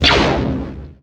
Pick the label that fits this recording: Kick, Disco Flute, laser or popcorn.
laser